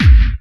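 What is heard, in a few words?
hard bass drums; Bazzdrumz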